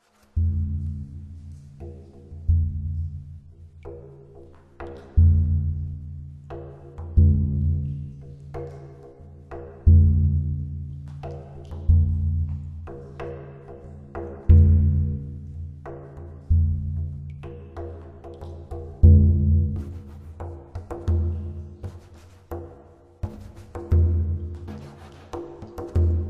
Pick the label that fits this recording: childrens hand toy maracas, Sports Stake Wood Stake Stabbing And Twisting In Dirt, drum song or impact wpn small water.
drum song